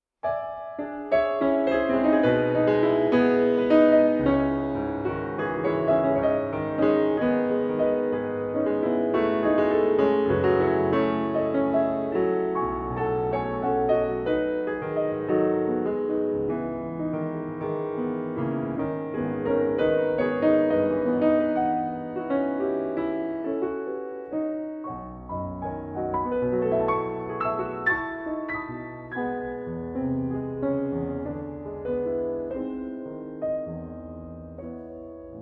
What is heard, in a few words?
How Fair This Spot for Piano